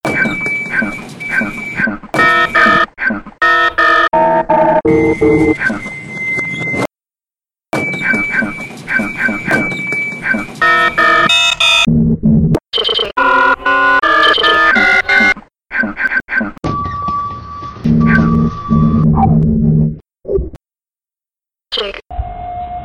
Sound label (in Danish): s-togs kaos